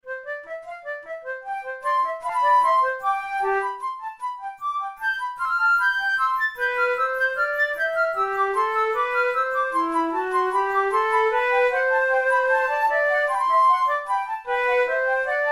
in c major, flute

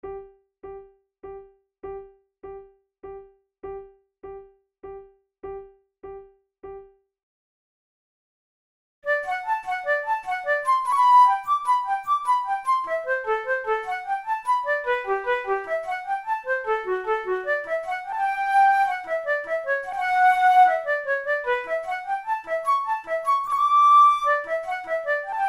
in g major, flute

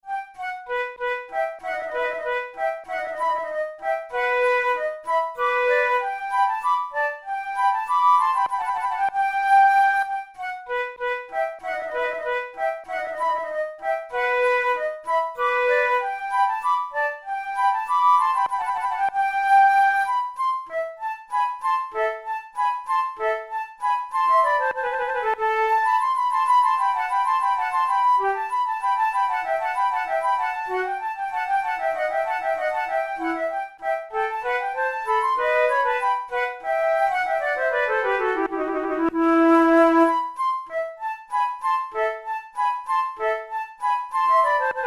allemande, flute

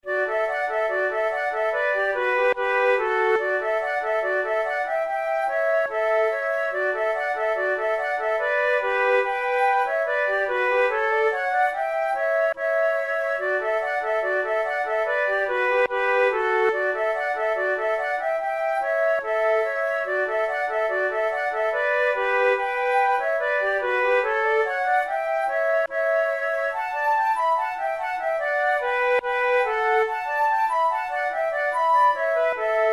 duet; Over the hills and far away

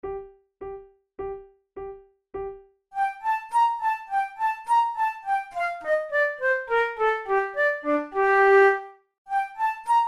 gavotta; loeillet de gant op5 no10 ii

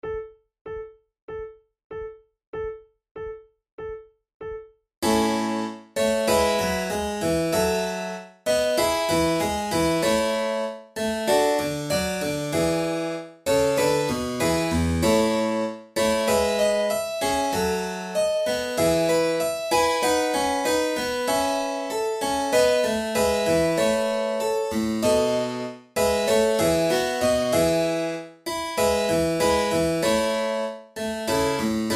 allegro, flute; corelli; violin sonata op5 no6 iii

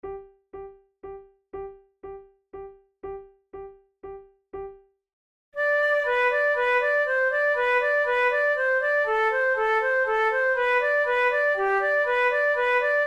waltz duet

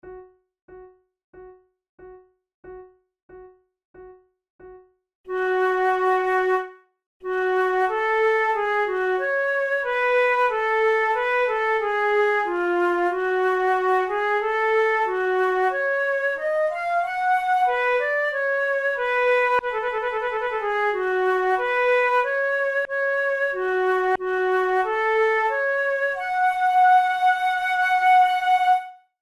prelude, flute

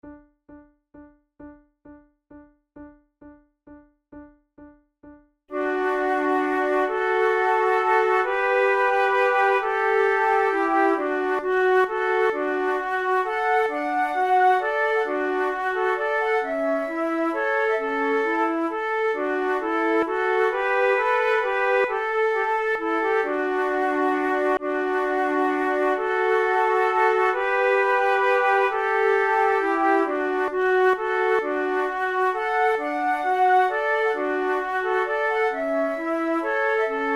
waltz trio, flute

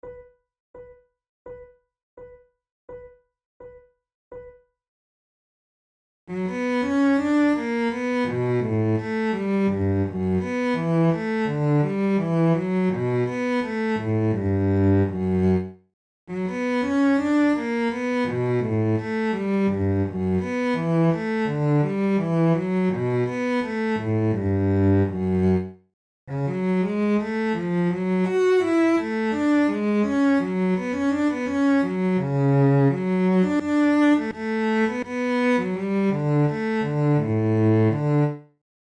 trio sonata tempo di gavotta, flute tunes; corelli

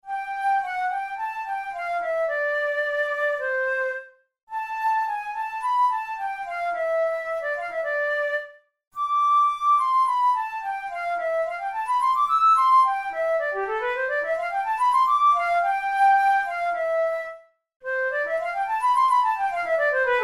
studies for the boehm flute; drouet 72

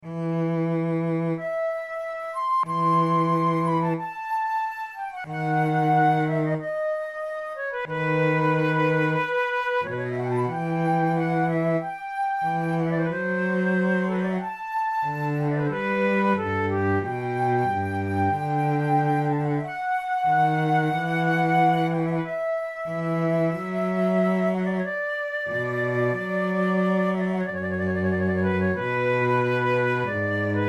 adagio, flute

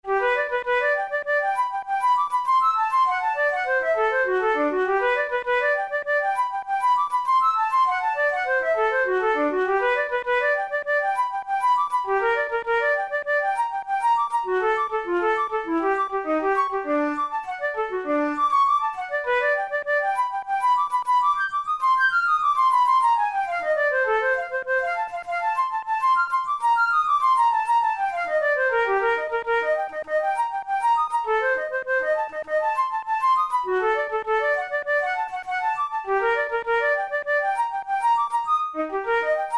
etudes, flute

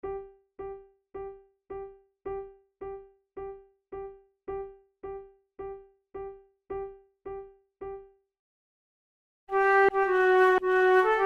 march duet; bellisle

